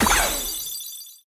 multiball; pinball

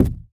ball Hit Flipper; pinball

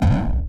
slingshot; pinball

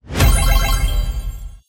goal; pinball

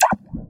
slingshot; pinball; Vital Garden

